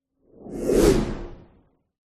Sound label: magic spell whoosh powerful energy pass electric